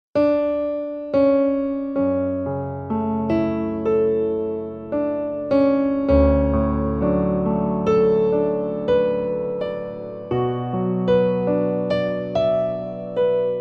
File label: Fantasia II Piano